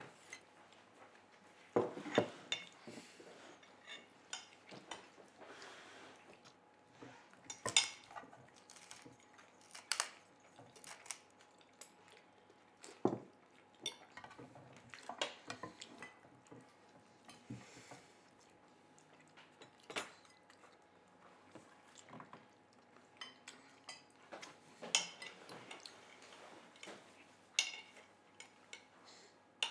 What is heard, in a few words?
Dinner Table Ambience